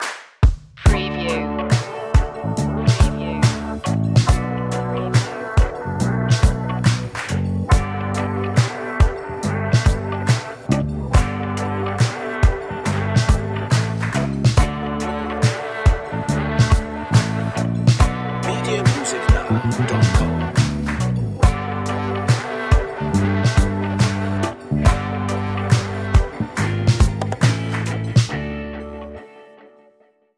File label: smooth groove